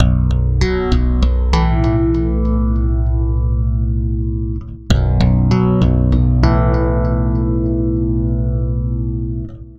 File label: Stick Loop, Stick Bass